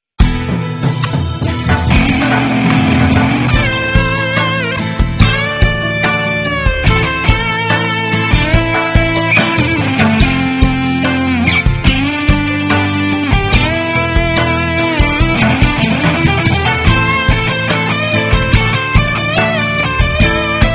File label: Solo Guitar